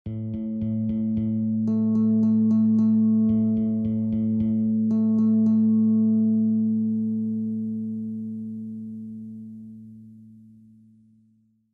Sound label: Delayed Guitar A